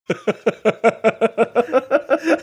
chuckling

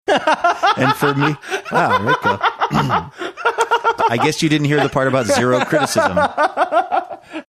having really good laugh

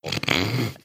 snorting

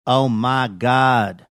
singing

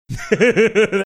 goofy laugh

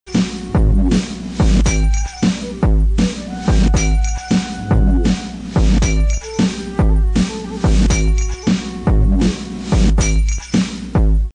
rap beats

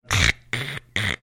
making clamping down clicking sounds

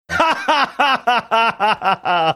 having good laugh

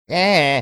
making funny sound

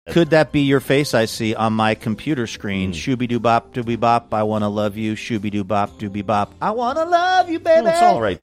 reciting lyrics and singing portion

